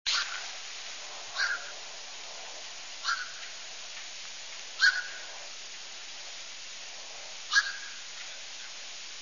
cormorant; birdsongs

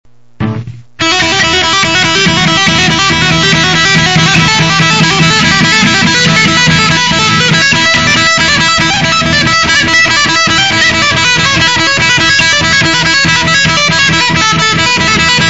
Quick Lick, electric

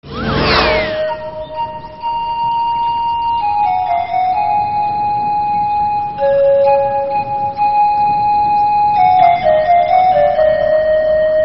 Flute

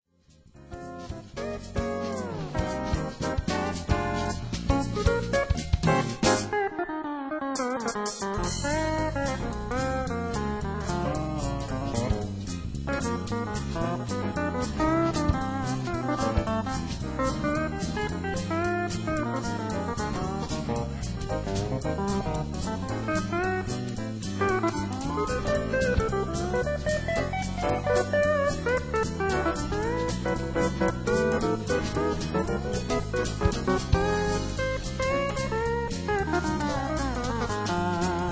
solo

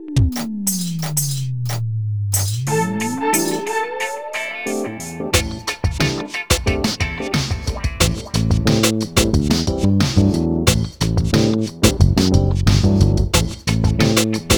Funky Jam mix

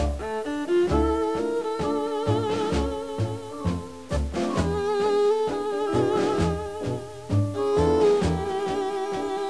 Tango

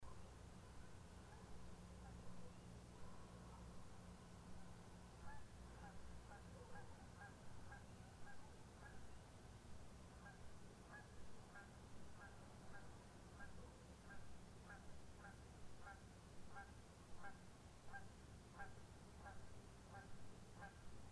unknown duck

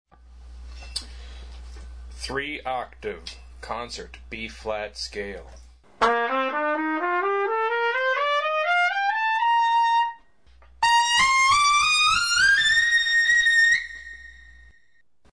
3 Octave Concert Bb Scale

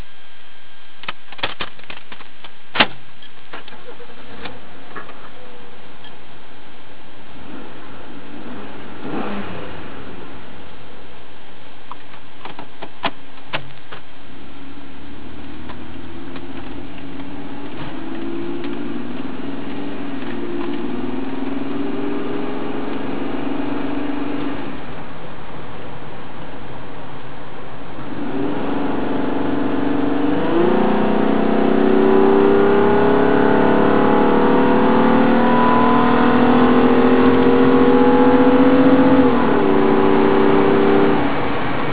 Intake New Idle

